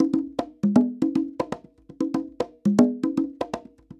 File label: Congas 120 bpm, African Drums; Quazibeat; African Secret